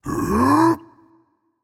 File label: breath, voicepack; smite; jorm